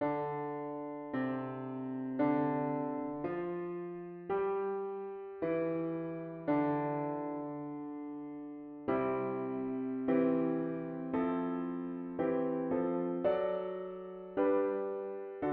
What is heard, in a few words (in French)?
hymne japon kimi; traditional